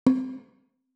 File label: ambient